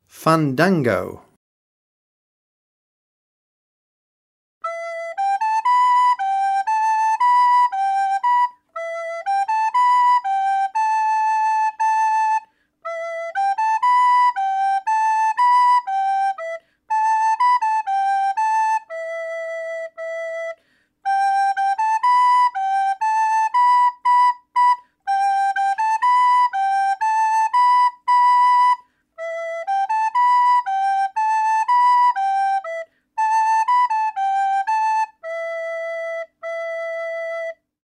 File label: Fandango Recorder